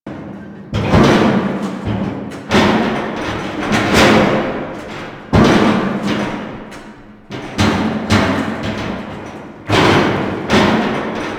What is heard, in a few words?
Machine Malfunction Metal Loop; Ambience; Props; Warframe; Corpus Railjack